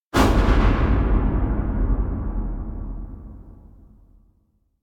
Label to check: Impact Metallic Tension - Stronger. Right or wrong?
Right